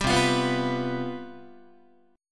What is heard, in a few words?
C Major 7th Suspended 4th, C, down, Guitar; CM7sus4 strummed